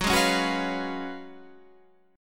F sharp Major 7th Suspended 2nd Suspended 4th

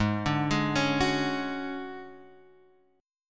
D Major 7th Flat 5th Ab, chord

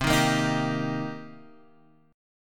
C Minor 7th, Guitar, Half Step Down

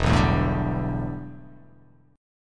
E-Minor 11th, Em11 chord; strummed; JGuitar

